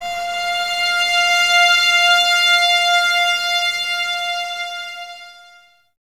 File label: violins F 5, string ensembles; Miroslav Vitous; 11 Violins